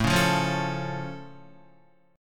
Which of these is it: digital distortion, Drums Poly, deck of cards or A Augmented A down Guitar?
A Augmented A down Guitar